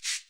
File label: Shaker